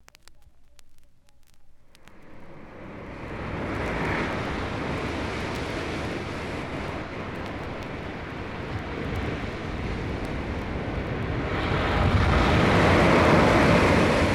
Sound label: Surf